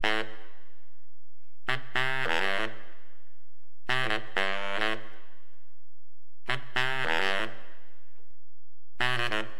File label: BARI, Wind; LiveAct Pool; AcidJazz Funk